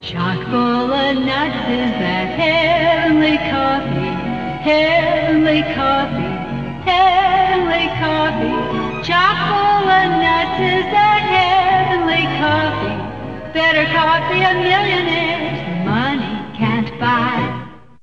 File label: Jingle